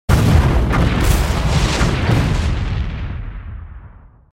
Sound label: Explosion - Initial impact, with multiple impacts; Hollywood Edge Sound Designers Tool Kit